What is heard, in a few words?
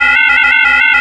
PROGRESS, SIGNALS; AKAI Anime Sound FX